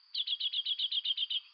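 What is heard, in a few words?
PARVULUS, Darwin's Finches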